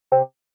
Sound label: Sci fi laboratory door access denied